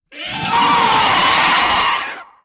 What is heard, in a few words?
scream air